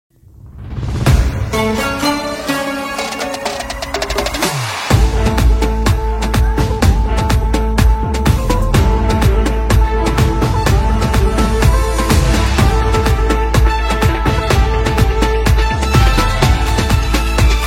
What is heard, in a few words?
Instrumental Music